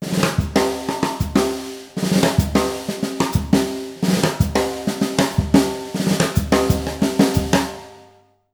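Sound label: breakbeat, 120; Univers Sons; Jazzistic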